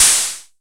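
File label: MS20 HAT OPEN; INIS - Funky Xtreams; MS-20 KIT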